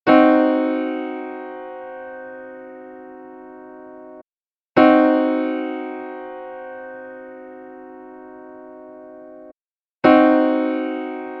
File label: Cdim Piano Chord